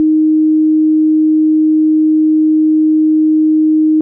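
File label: Clean Sine DX100 D#0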